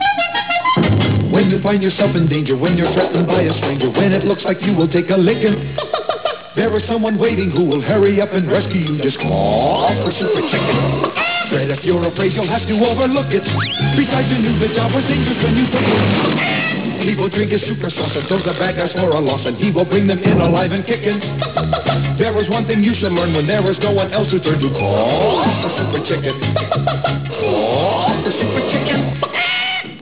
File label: theme full; sctheme